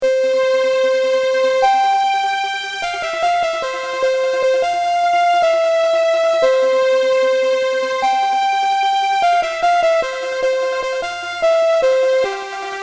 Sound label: victory theme, Game Music